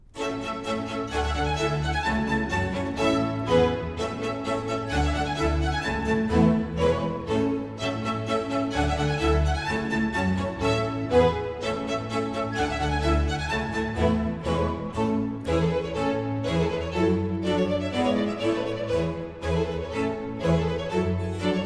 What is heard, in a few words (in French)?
suite d'orchestre, Contredanse; Jean-Philippe Rameau; Dardanus